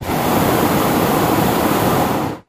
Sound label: Hot Air Balloon Burner, Medium Close, Medium Hard On, Off